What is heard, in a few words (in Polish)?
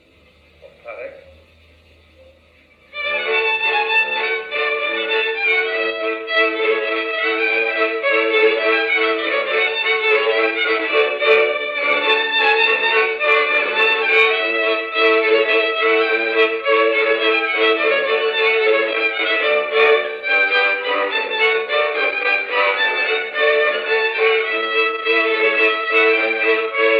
instrumentalny dygacz